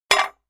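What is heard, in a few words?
Large Tile Square Drop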